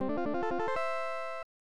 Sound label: Open Treasure Chest; Yuujin no Furi Furi Girls; snes